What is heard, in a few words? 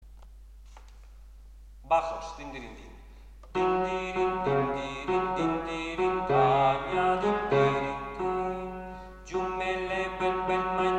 baj; Anónimo; Dindirin, dindirin